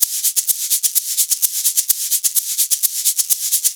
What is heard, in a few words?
Shaker Loop, 128 BPM; Vengeance Samples